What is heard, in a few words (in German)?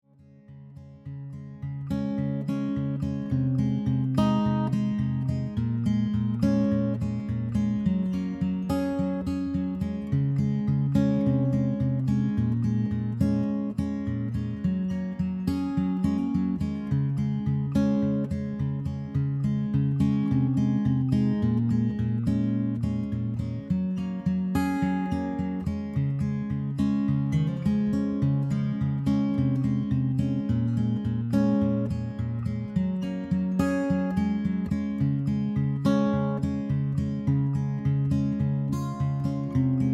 gitarre